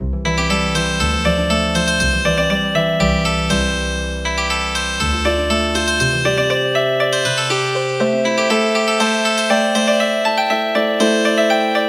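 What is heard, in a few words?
Healing Harp, traditional; Thaleba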